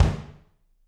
BD, Drums; Bob Clearmountain Drums I

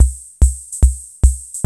TR-66 SWING; Crypton - DrumBase5000

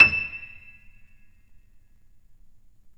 PNO, KEYS; Synclavier Sampler Library

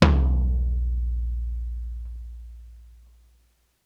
Tom Real Long; Lost Tapes From Mars

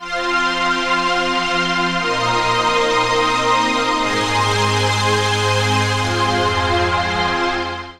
Huge String; Sonic Foundry (Sony Creative Software); The Electronic Music Manuscript Collection

